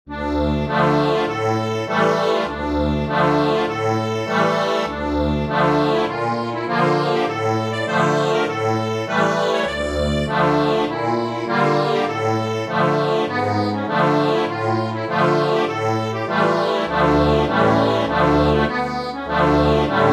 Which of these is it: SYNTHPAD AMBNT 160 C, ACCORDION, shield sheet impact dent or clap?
ACCORDION